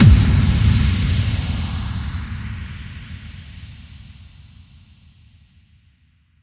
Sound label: FX Kicks